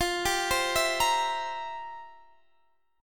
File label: F Major 7th Suspended 2nd Suspended 4th, Guitar, Drop D; down; chord shape